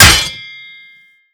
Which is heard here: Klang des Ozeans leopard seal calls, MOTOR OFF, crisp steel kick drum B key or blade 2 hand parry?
blade 2 hand parry